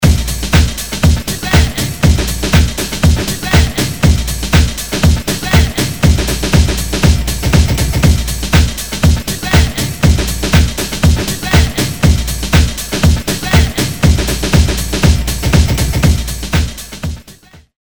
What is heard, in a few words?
Groove, Hammerhead; Bram Bos